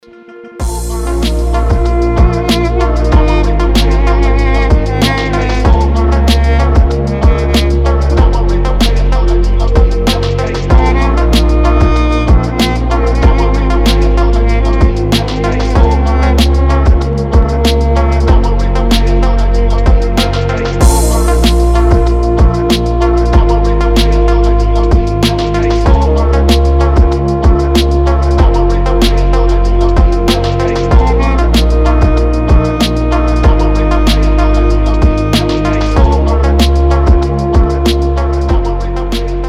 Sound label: arm phonk